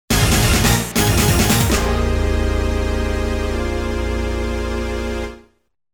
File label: Game Over; Sonic the Fighters Original Soundtrack